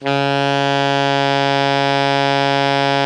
ALTO MF C#2, Sax; Greytsounds; Philip Wolfe David Crozier's Saxophones

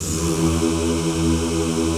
ZZZ E1, Classical Choir; Best Service; Extended Classical Choir

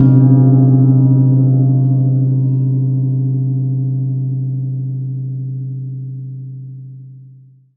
ASI GONG C3; USB Soundscan vol.60; Asian Traditions; AKAI; MISC PERC

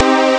strings min; Amiga Soundtracker Sample Packs

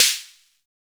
Snare, Drum & Bass; Claps; AMG - Prototype Drum & Bass; Snares

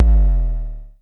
Klone Dual Percussion Synthesiser bass

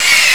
Applause; Power Drive

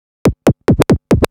Scratch Fills 140BPM

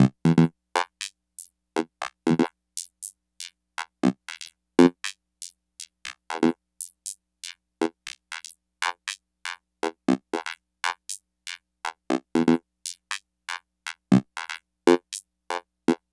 Techno Synth

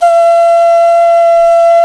D OCRNA, CLAYFLUTE

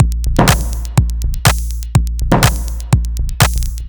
A# 123bpm, House Drumloops; Zero-G House Fabrik Drumloops

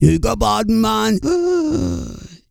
REGGAE, Vocal Planet World Voices

